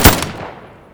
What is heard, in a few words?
bullet small; minecraft